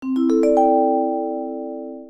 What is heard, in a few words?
Whisper; Sony Ericsson; J20i Hazel